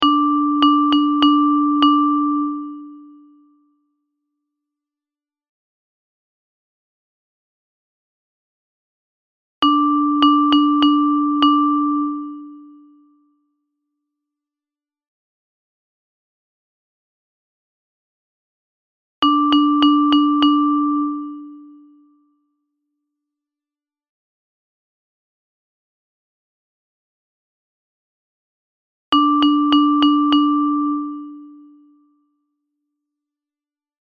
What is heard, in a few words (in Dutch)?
ritmes